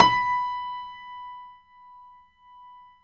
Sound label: PIANO B5, STEINWAY; Greytsounds - Philip Wolfe David Crozier's Saxophones; GR8 STEINWAY